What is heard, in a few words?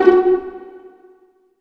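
F#3 pizzicato, strings; AKAI S-Series CD-ROM Sound Library VOL-8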